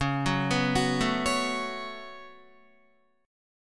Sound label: Db 7th Suspended 4th Sharp 5th Guitar; 8,9,11,11,9,9; 4F,4A,5C,5G,5C,6E; arpeggiated; chord shape; JGuitar